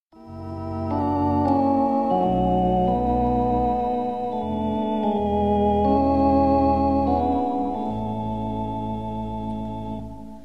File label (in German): Maennerquartett